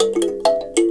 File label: SANSA LICKS, KALIMBAS; Spectrasonics; Heart of Africa